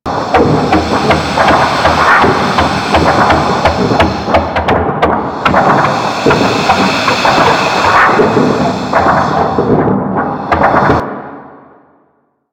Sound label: Rail Jack Destroyer Machine Loop; Ambience; Crew Ships; Grineer